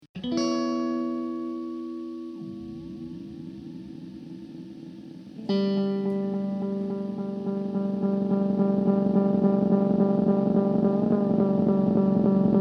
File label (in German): SELBSTOSZILLIEREND